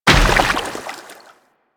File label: Moa Step Water, footsteps; Enemies; Warframe